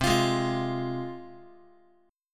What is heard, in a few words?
C Suspended 4th Sharp 5th, C, down, Guitar; Csus4#5 strummed; x,8,8,6,9,x; JGuitar